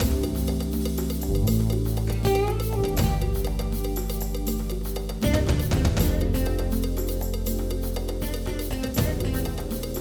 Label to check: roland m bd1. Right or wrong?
Right